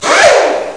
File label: drill; PUZMANIA